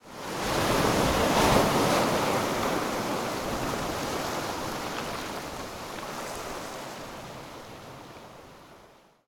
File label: waves, Ice Fields